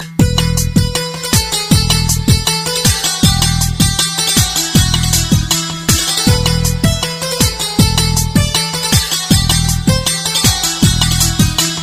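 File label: instrumental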